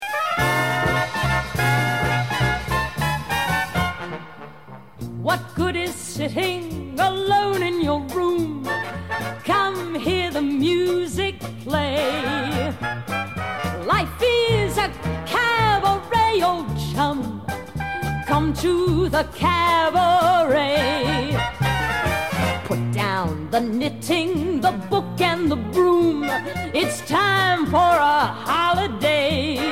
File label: Vocal Guide